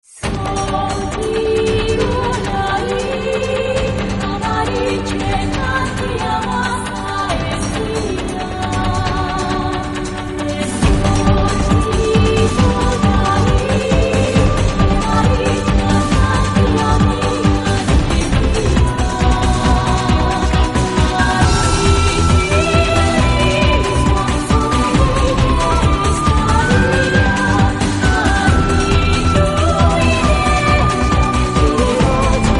bgm; ChaosFantasy

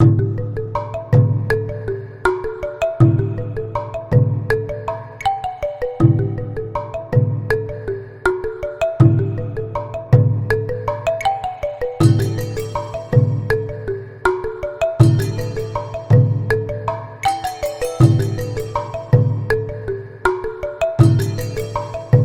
Secret Forest

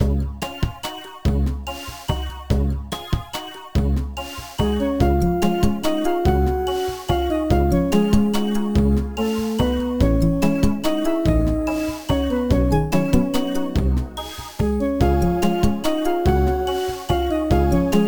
Underwater Inside; TowerOfGreenSlime

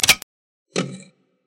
door

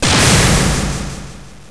normal boom

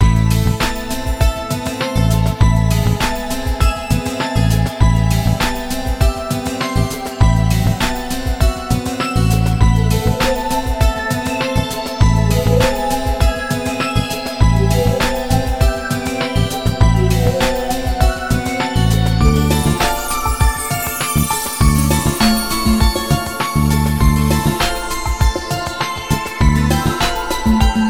mine

